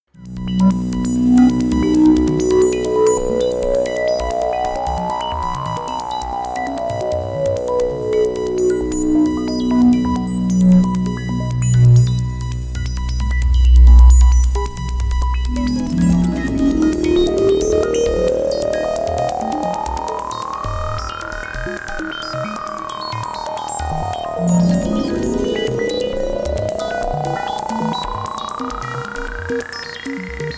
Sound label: Roland JD800